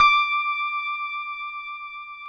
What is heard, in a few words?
PIA MICRST, MICRO-PIANO, Roland; Sound & Vision - Gigapack II